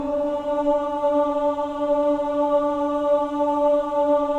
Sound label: CHO AH, Choir of Ahs; Roland - LA Composer vol. 1